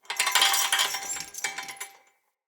Cage Rattle, Hanging Cages

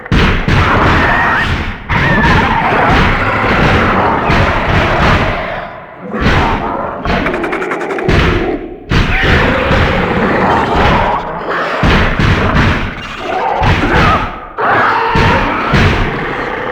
Blast Door Infested Banging Loop; Warframe; Nineteen Ninety Nine

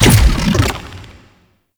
Octopede Lash Limbs Beam Stop; Warframe; ManInTheWall

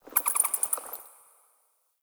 Critter Random; Ambience; Warframe; Nokko Colony

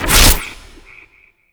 Experiment Riffle Shot Stop, Weapons; Warframe; Corpus; Sent Experimental Riffle

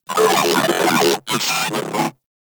Moa Vocal Attack; Warframe

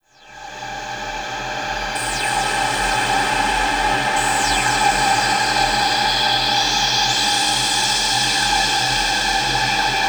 Exterior Ominous Void Weave; Warframe; RailJack; Ambience

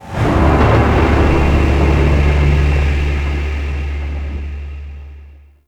Mood Change Stinger; Warframe; Duviri

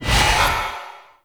Void Dragon Baby Mine Boost; Enemies; Gameplay; Warframe; Duviri

